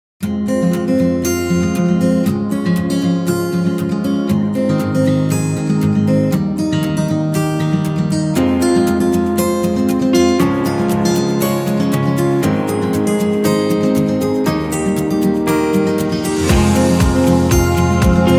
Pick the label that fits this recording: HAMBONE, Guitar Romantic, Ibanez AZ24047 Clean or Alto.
Guitar Romantic